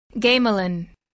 gamelan

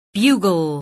bugle

